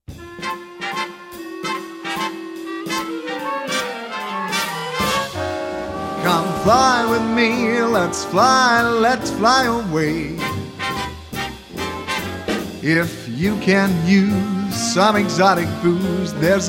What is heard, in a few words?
Swing; 20th Century Swing